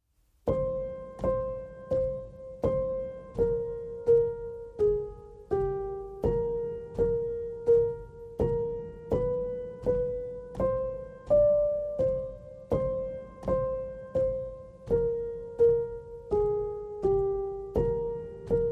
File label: Pulse Piano Mix